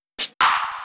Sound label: 135 Clap Fx; Zero-G Trance Inducer; 90 s Sample CDs